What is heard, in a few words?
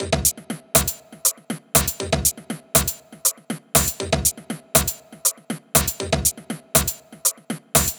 drumloop